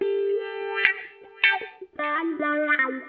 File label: GUITAR